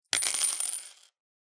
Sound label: Coins falling on floor